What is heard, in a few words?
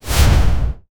game sound effect, steam release